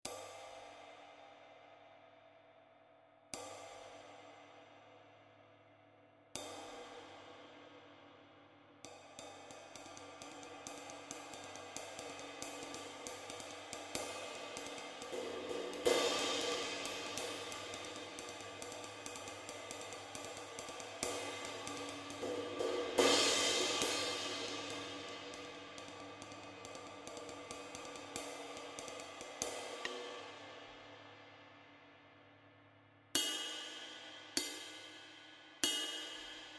22" Trad Thin Ride, Bosphorus; cymbal; 2308g

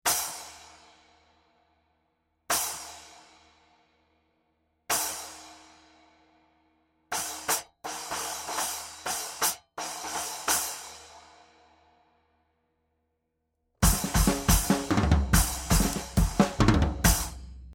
12" Turk Splash, Bosphorus; cymbal; 380g

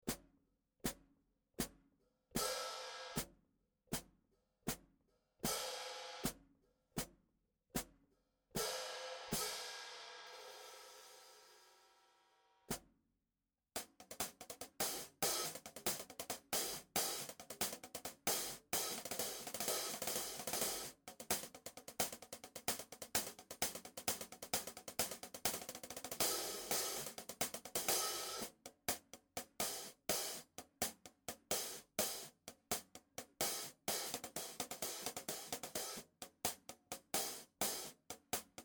15" Black Pearl Hi-Hat, Bosphorus; cymbal; 1000/1200g

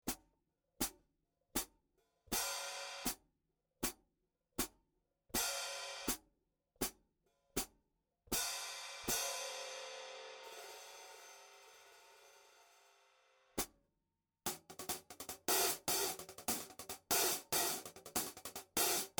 14 Trad Dark/Thin Hi-Hat, Bosphorus

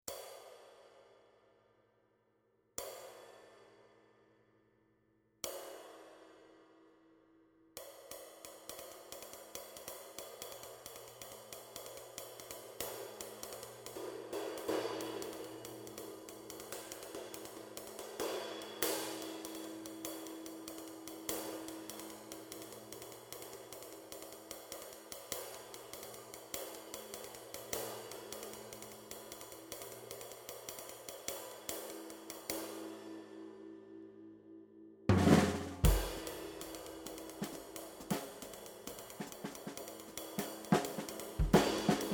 20" Master Vintage FLAT Ride, Bosphorus; cymbal

